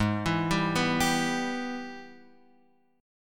Ab Minor Major 7th Sharp 5th, down, Guitar, Open F; arpeggiated; JGuitar